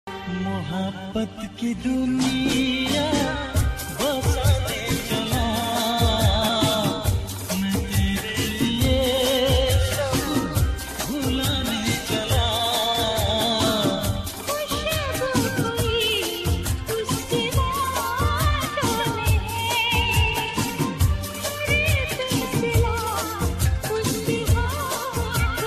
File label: DHOLKI BASS MIX